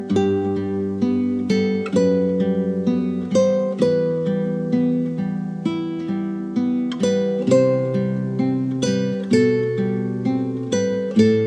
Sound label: piano; gintama